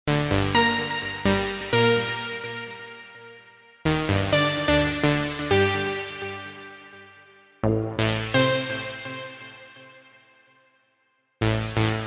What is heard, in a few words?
melodic synths